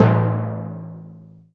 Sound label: Timpani a2; BGM; Nintendo; July 2020 Gigaleak